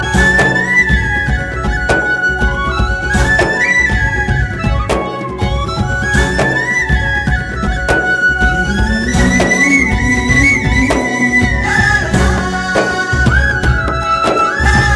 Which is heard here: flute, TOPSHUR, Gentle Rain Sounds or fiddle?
flute